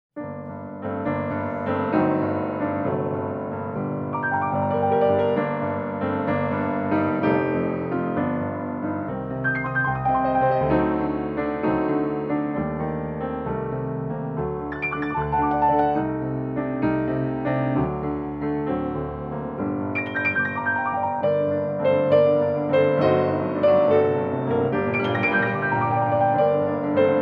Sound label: hungarian dance g moll, piano; j brahms